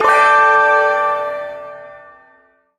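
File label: Shawzin Pentatonic Major Chord; Warframe; Duviri Ersatz Shawzin; Chords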